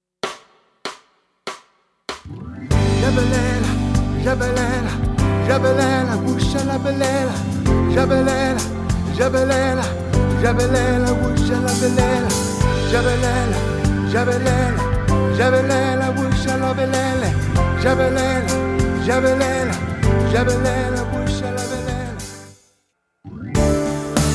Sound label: Let the choir sing Bas